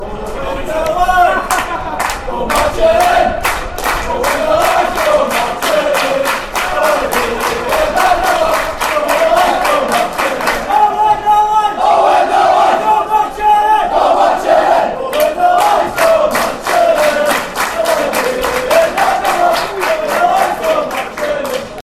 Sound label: oh when lions go marching, fanchants